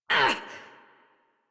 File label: Pain Short, Adult; Warframe; New War; Adult Operator